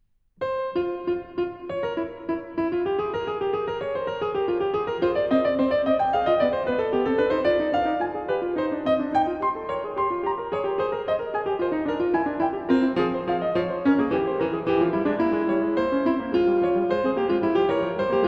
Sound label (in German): Fuge f-Moll; BWV 881; J.S. Bach; Das Wohltemperierte Klavier Book 2